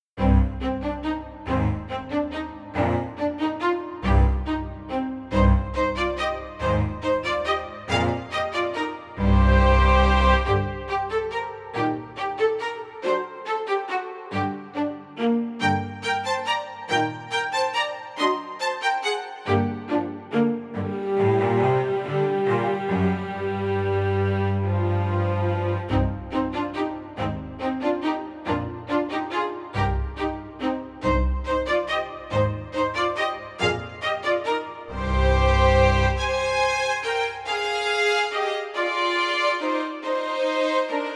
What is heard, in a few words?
String Trio Minuet